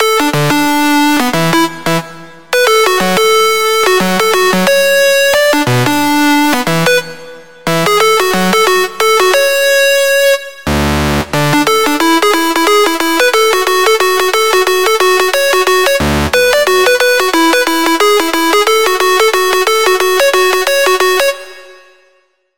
Lead, Sylenth1; Lead - Event Horizon; Routed Sound; Sylenth1 Expansion - Star Scavenger Vol.1

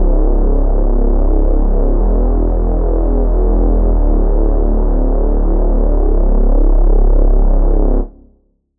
Reese

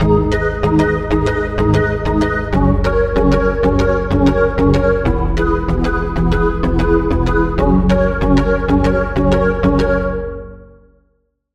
Key

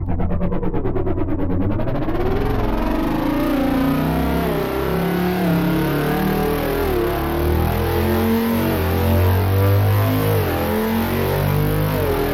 Engine; Routed Sound; Spire Expansion - Chaos Bringer Vol.1

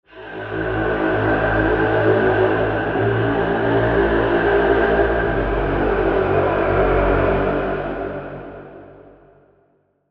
Pad; Mirror Field; Routed Sound; Vital Expansion - Chaos Bringer Vol.1

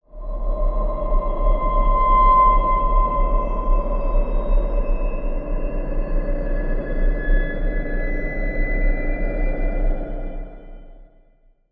SFX Tunnel; Sylenth1; Routed Sound; Sylenth1 Expansion - Quantum Enforcer Vol.1

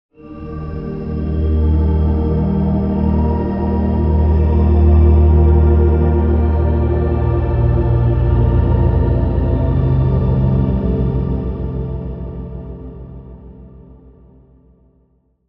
Pad, Spire; Routed Sound; Spire Expansion - Star Scavenger Vol.1

